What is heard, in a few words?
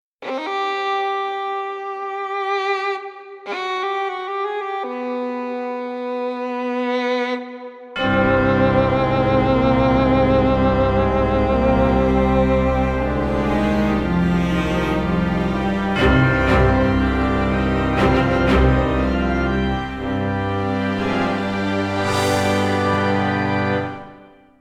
hall intro; Identity V